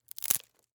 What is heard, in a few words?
woven basket crack